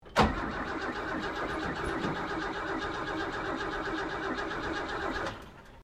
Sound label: CAR HOLDEN HD PREMIER IGNITION FAIL, Classic Australian cars; Holden Hd Premier 1965; Vehicles